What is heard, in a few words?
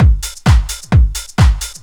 drum 130 trance